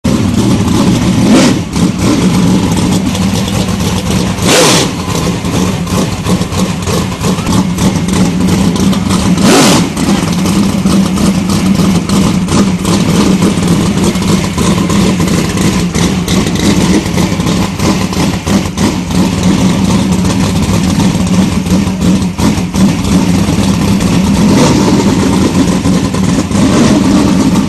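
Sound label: Sprintcar Engine Idle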